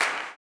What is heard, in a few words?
CLAP, Blues Soul; Spectrasonics Vocal Planet